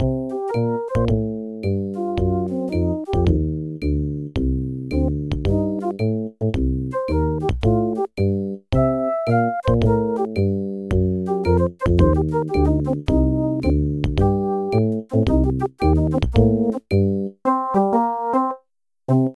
Sound Blaster Pro 2; SimCity 2000; MS-DOS Version; Pale City

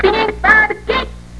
SPIN; The SoundBlaster Multi Media Experience vol 4

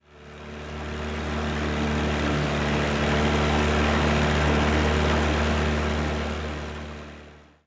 BOAT

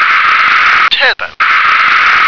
turbo

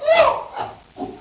CHIMP

